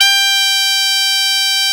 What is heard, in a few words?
BP MID G2; USB Soundscan vol.42 - Celtic Flavours; AKAI